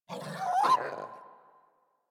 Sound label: Puppy Idle Speak Playful; Warframe; Kubrow